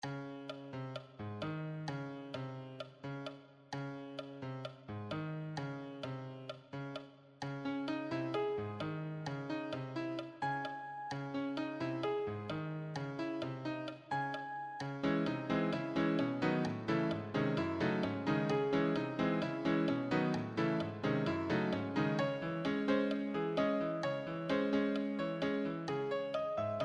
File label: advanced solo, piano